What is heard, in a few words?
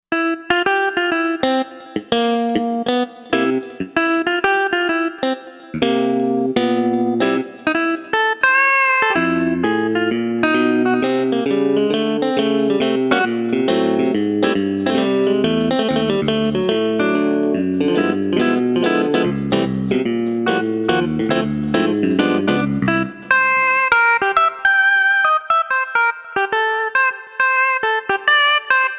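Guitar Lead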